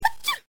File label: sneeze